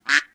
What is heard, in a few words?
duck quack; DuckShoot